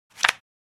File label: Playing cards flipping a single card from a deck poker blackjack